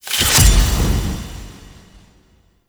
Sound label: Stance Ability Deactivate; Warframe; Teshin